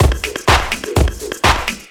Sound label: drm 125, drumloops; PS-28 Vocal House Samples; inthemix